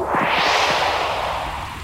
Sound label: regen